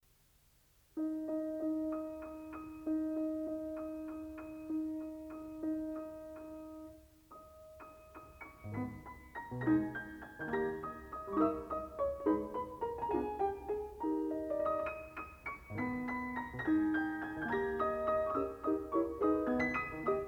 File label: la campanella